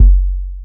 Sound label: Kick, Trance